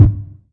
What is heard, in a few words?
kick tubular; Kicks; single hits